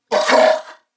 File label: Surprised Corpus Venus Male, en; Dialog; Barks; Battle; Warframe; CorpusVenusMaleB